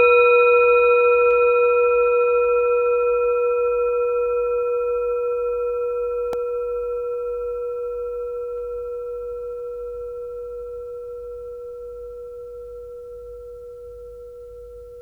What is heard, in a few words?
Crown Chakra Tone